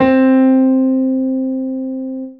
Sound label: PIANO, Dance Mega Synth; Best Service